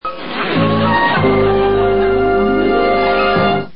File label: music